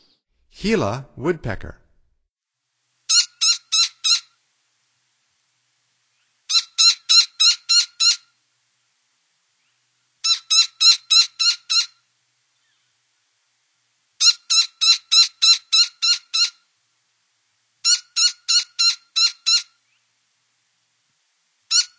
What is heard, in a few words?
Gila Woodpecker; North America; Stokes